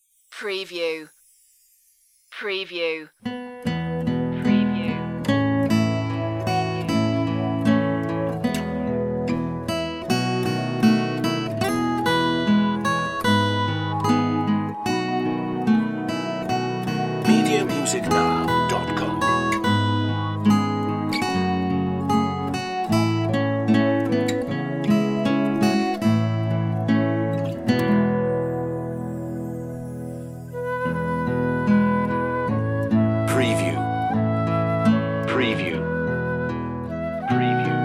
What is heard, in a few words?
orchestral flute version